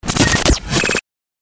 Galery Noise; Sound Ideas - Galery Noise 001

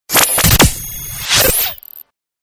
Galery Noise; Sound Ideas - Galery Noise 002

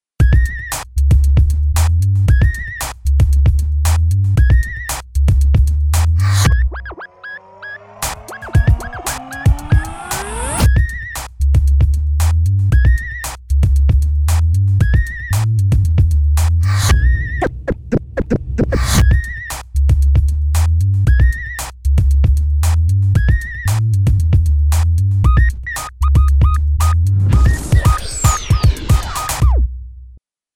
hip hop

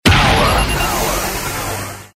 Galery Noise; Sound Ideas - Galery Noise 001

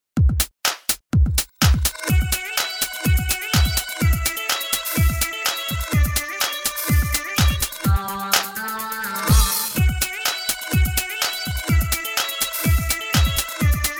el bedo de techno

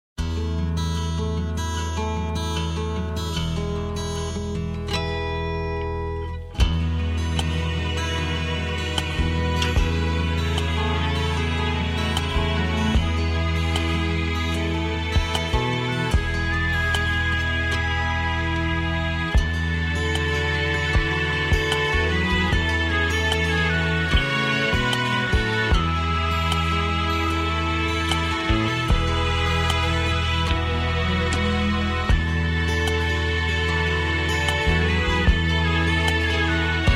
Easy Listening & Classical; Sound Ideas

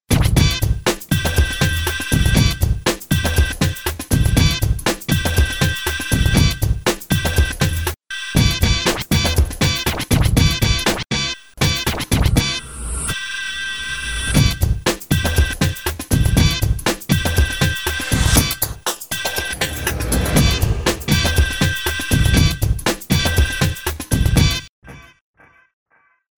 hippedy hoppedy, bed

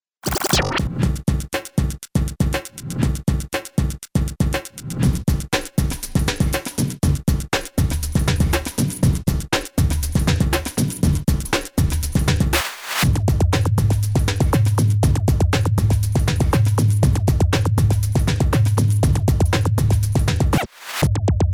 thumpy

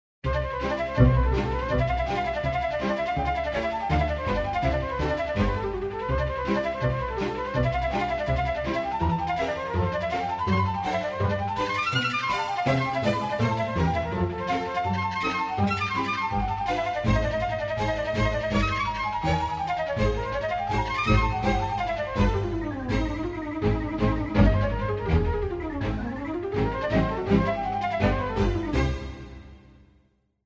Easy Listening & Classical; Sound Ideas

